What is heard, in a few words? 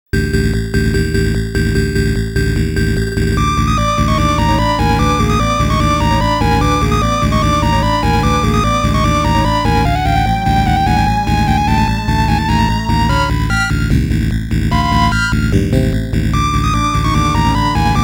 BOSS BGM